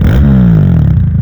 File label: Car Rev; Nicktoons Nitro; arcade